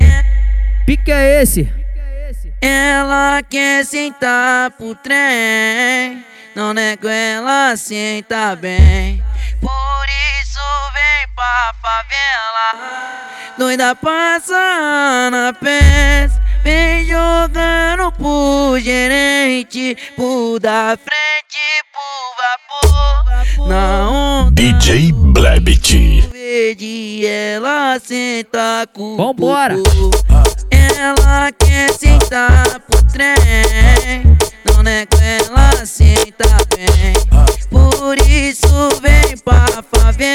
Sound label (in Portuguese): funk sertanejo